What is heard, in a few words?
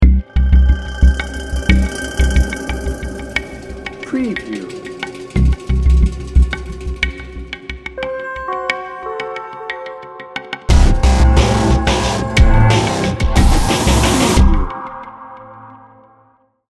disturbing trends